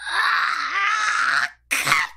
嗚咽; 情けない声

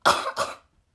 命を削る音; ushiumi

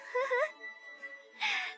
ふふっ, 笑い声

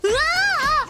うわぁあぁあ, 情けない声

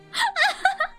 あははっ; マイクラ

